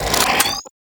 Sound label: Reload, Weapons; Sapient Primary Reload C; Warframe